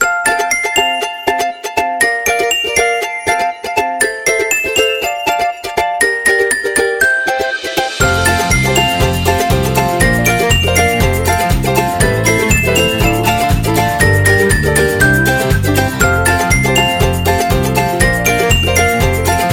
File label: Happy Food; cooking show